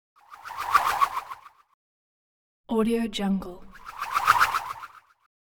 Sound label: swish twirl short spinning object fast spurts